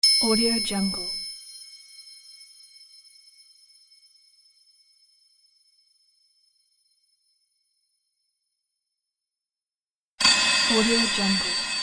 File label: bright iron ringing impact